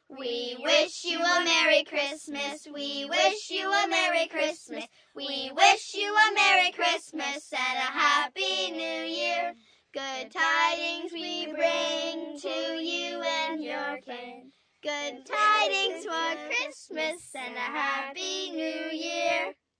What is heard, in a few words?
Group, Singing, Group, 5 - 6 children, kids, males; 'We Wish You a Merry Christmas'; females; girls; boys; song; laugh; human voice; VoiceBox